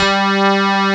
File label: HARD RHODES; Pro-Rec - Synthsonic